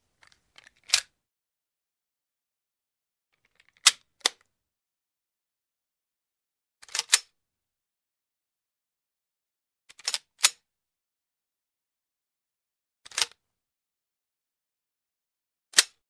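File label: sounds of reloading changing the clip of a pistol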